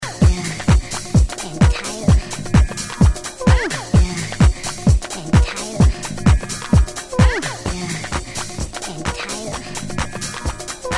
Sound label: Club swing